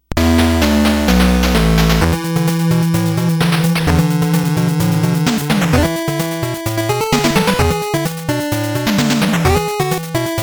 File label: Race Theme; Eliminator Boat Duel; NES